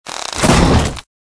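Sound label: lizard attack bottom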